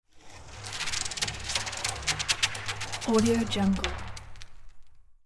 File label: matter mayhem plastic big container dragged; soundmorph